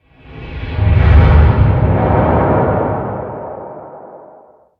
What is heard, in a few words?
Pylon damage; Barotraumatic